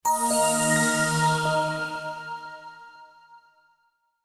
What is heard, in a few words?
Software Logos A soft Reminder